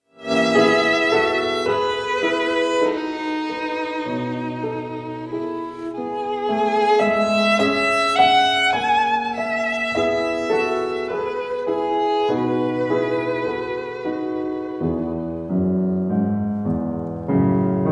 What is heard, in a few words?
dumka for violin and piano